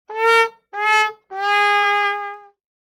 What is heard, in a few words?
fail; rpg